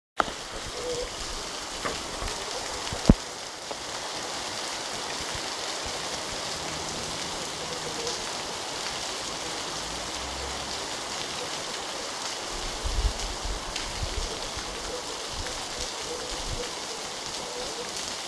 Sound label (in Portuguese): chuva trovao